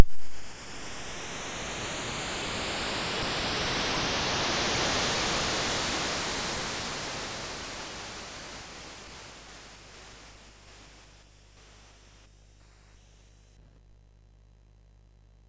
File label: sid MOS6581R2; California Games